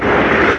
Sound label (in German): NAGEL; DEMOUK